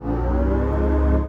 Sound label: heat shields boost, ships combat; CosmicRage